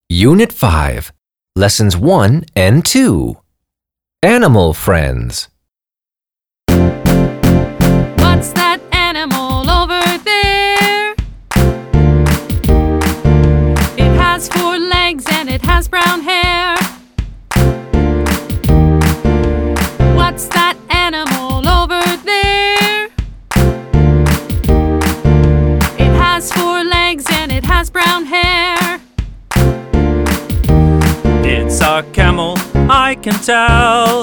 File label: Class Audio Sample Song; Student Book 4; Animal Friends